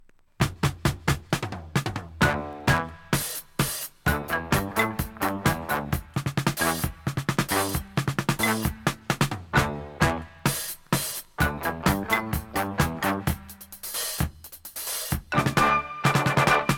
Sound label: groove